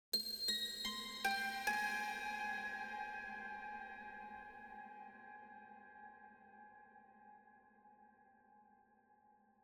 Harmonic Plucks Mystic Harmonic Plucks 5 Note Arpeggio; Hollywood Edge; sound designer's toolkit v2